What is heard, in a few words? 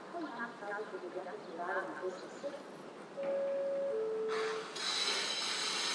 train station while waiting